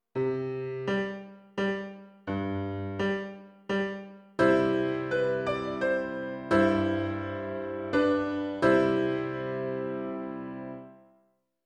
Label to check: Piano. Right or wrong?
Right